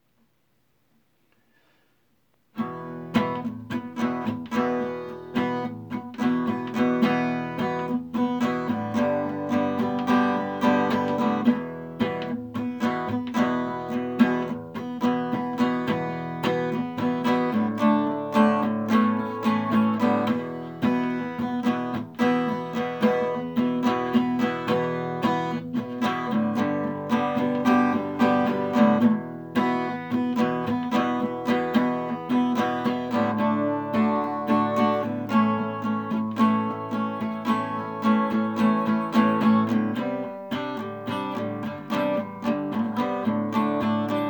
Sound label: acoustic; brick and mortar; los chilaquiles